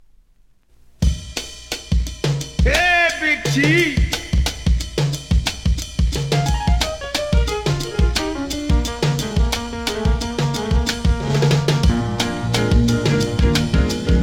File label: PIANO